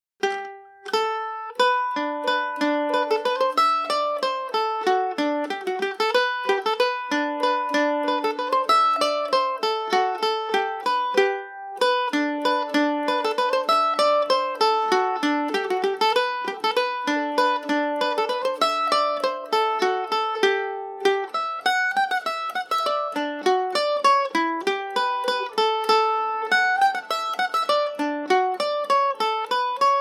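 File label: polka G major and D major G and O, irish mandolin; The Belfast Polka; G&O #34 mandolin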